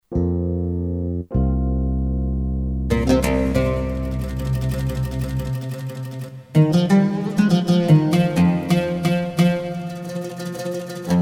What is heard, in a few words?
oud; triton extreme